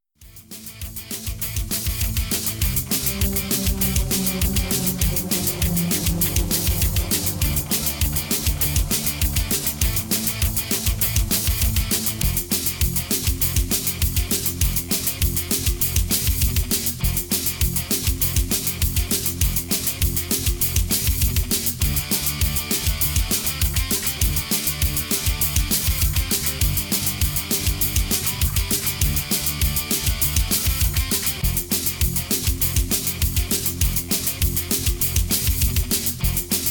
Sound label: instrumental